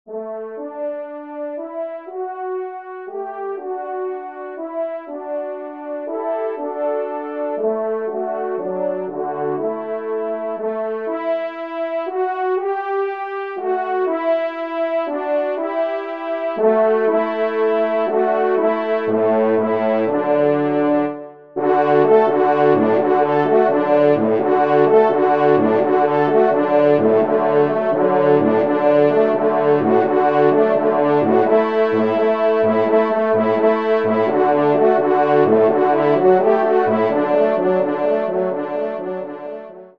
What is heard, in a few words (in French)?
trompe; Aurore de Chasse; Victor Viney; 3e Trompe